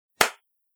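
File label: slap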